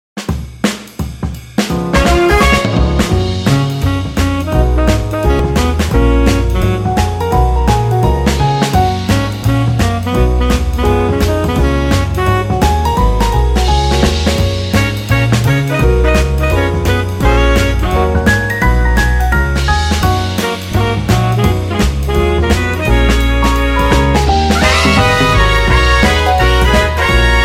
Jazz Remix; dance

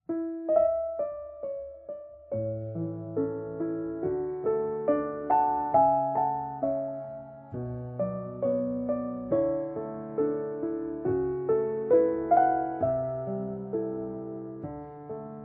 Piano cover; Animal Crossing： New Leaf

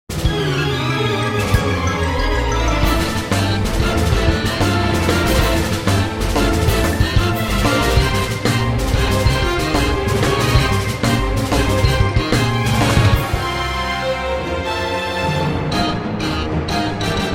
Trainer Battle Music, Orchestral Remix; Pokemon HG⧸SS

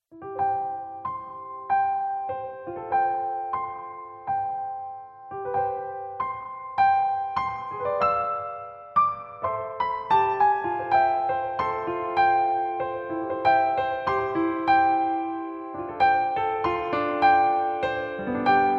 Lullaby Piano Version

